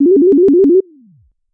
Bass Sine

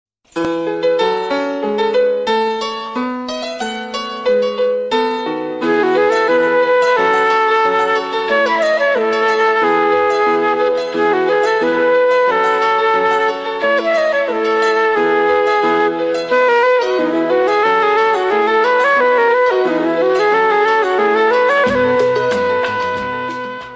Piano Flute

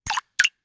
Bubbles; Samsung

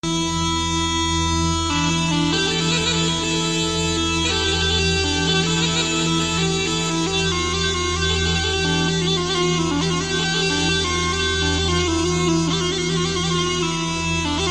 VRbeat

